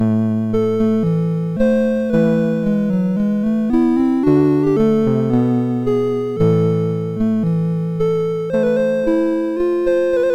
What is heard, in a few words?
Pavane, MOS6581R4